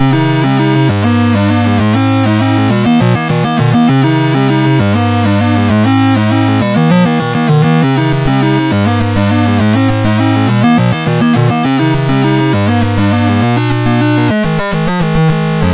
MOS6581R2, Commodore

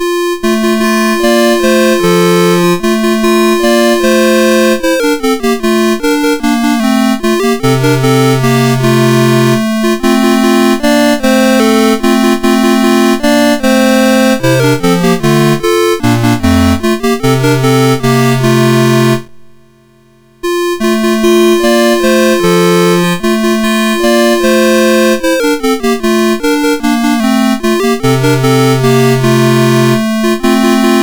MOS 6581 R2

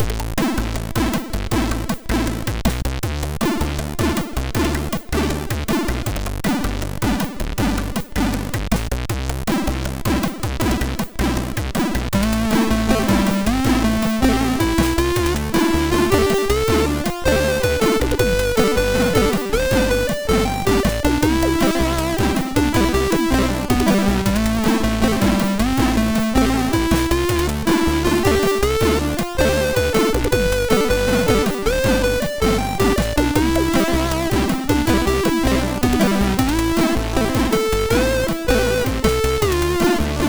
sid MOS6581R3

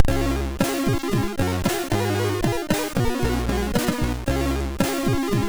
Radio Jingle, MOS6581; MOS6581R3

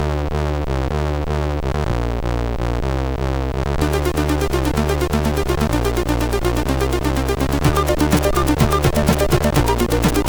Game music, sid MOS6581R4

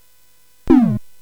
Dark Castle, CSG8580R5

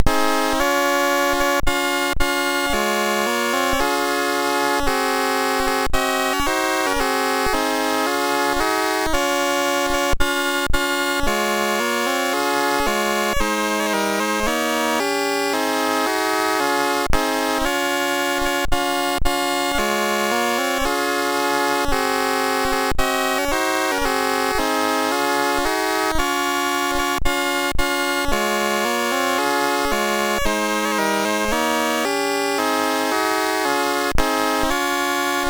sid MOS6581R3, GAMES; Bath Time

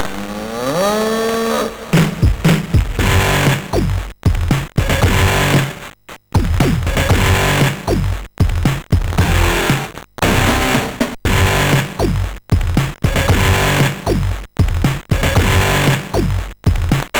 Funky Cold Bayernpower, MOS6581R3

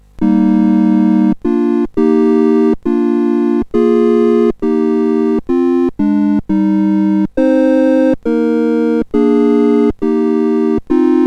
CSG 8580R5; Deutschlandlied BASIC

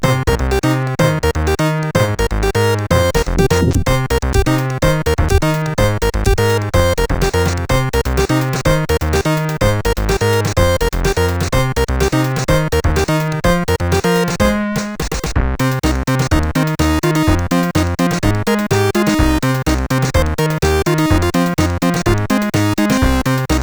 sid, CSG8580R5; 3 Days

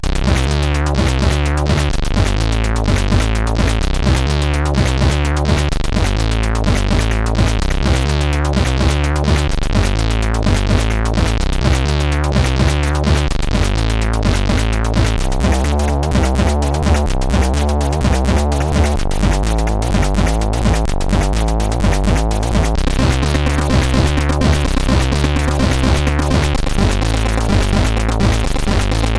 Amiga Noid Intro sid CSG8580R5

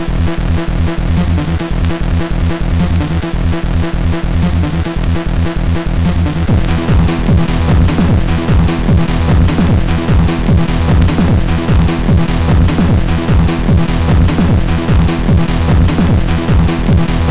Infect, MOS6581R4; Early Tunes

